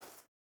footstep burlap sand jump start; Rust

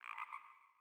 sting frog; Rust

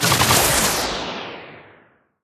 torpedo; World Of Warships; weapons

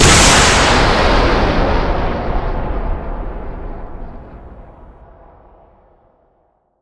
Grad Firing; Weapons; DCS

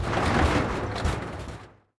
truck rattle

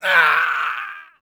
hurt heavy

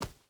footstep hide forest run; Rust

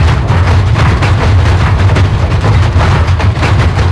roughness light tank; World of Tanks Blitz; rough lt

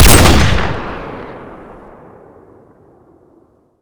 weap barrett

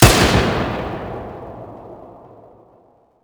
mg 1shot stereo cave

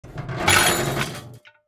objects, World of Tanks

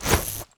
baton swing start

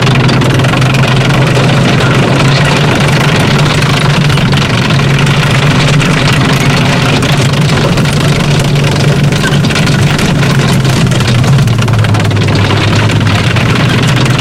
tracks speed, tanks; War Thunder

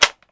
Inf Weapons Foley; Red Orchestra Ostfront 41-45

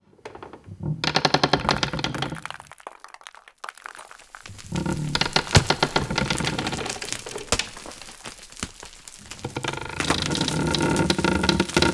wood rocks heavy; Call of Duty Modern Warfare

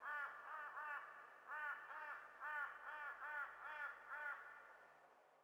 sting crow; Rust

